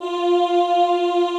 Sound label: OOH F3, Classical Choir; Best Service; Extended Classical Choir